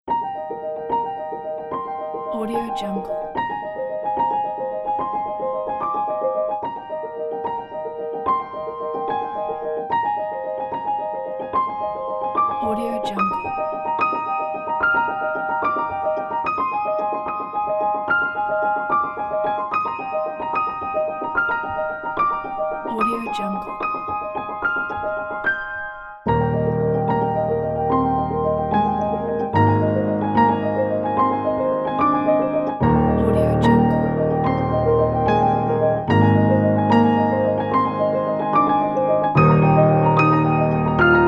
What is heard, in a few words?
fast moving flying solo piano